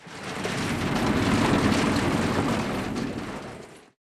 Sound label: ambient port; World Of Warships; port3d